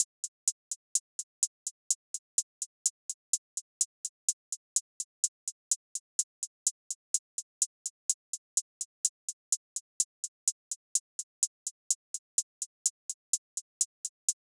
Drum Loop 126 BPM Hihat, Hip Hop; Drum Loops; Loop Stems; Cymatics; Casa Drum Loop

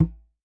Conga - A; Percussion; Cymatics - Infinity Beta Pack 2.0; Zeus Percussion Collection

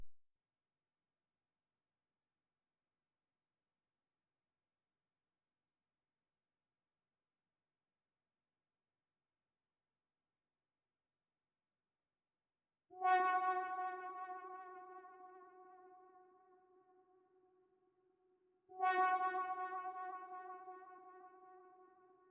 166 BPM F# Min Synth; Trap; Melodies; Loop Stems; Cymatics; Trap Starter Pack; Toronto

